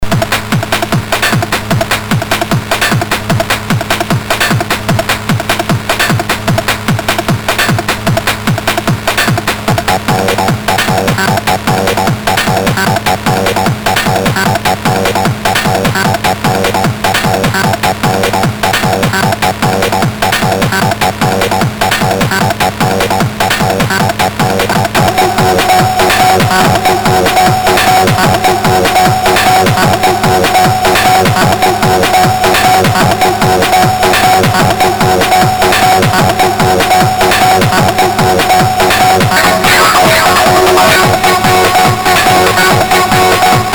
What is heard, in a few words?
Drums, sid MOS6581R4